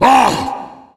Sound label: tank voice